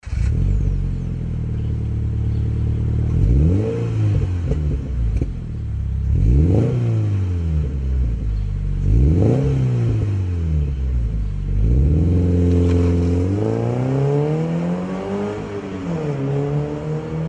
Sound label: magnaflow exhaust systems